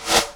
REV CRAZY, Techno Trance; E-MU Formula 4000 Series Vol. 2; Dance Set 2

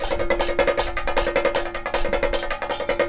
KATAK, Indian Traditions

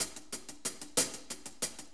6-8 HiHats 60, HiHat & Ride Loops; Sonic Foundry (Sony Creative Software) - Loops & Samples Drum Tools

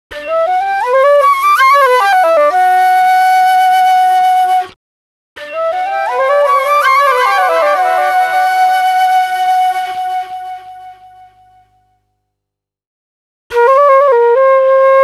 breaks, Jungle; Zero-G Jungle Frenzy 2

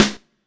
SD, dance drums hip

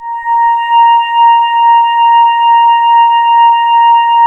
A#, Synclav FM; Big Fish Audio; SPACE1

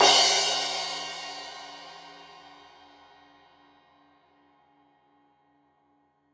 Crash 15in L edge, Cymbals Stereo

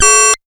BEEP; Best Service Dream Experience; SF+EFFECTS

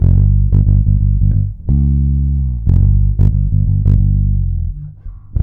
G, bass; Spectrasonics; Bass Legends; 90 S.BRONX

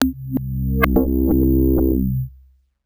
Synth Hits, C3; Zero-G; Soundclash Nu Dance Electro; Acidized

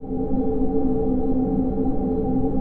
PAD, DIGI-PADS

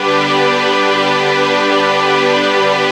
pads G; Zero-G; Total Drum Bass

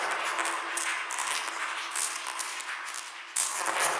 sparse soundscape samples; ReLooped

